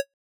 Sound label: deselect, retro